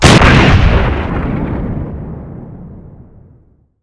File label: tiger cannon fire; WAR